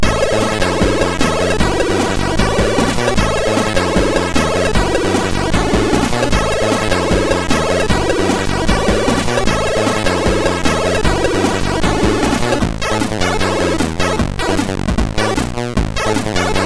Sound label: Unreleased Song, MOS6581R4